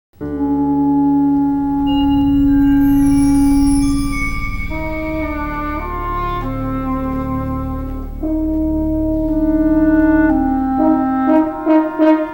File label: spooky 3 mix